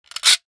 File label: Gadget, Motors & Switches; 1001 Sound Effects